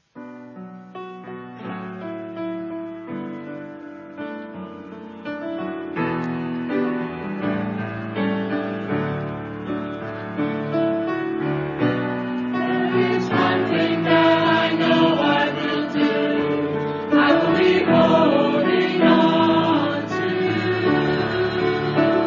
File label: choir